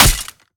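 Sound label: melee character gun large steel; viper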